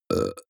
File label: burp short; soundpool